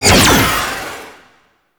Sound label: Scythe Proj Whizz; Stalker Jade Scythe; Warframe; Weapons